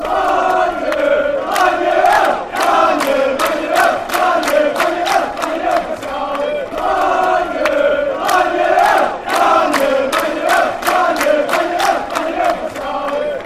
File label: fan chants; manuel pascali